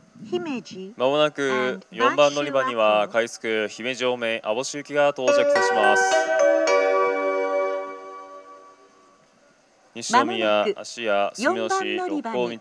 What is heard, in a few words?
amagasaki rapid aboshi; station; jr-west